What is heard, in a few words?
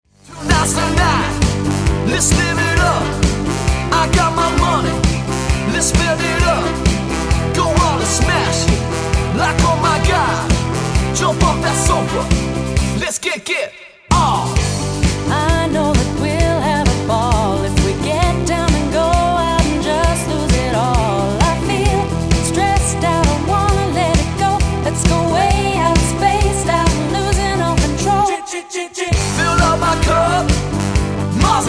Horns